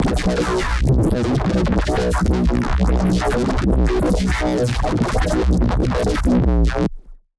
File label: Unused BIASOS Neuro, bass